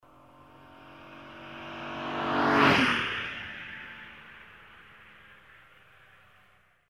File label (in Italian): auto, olofonia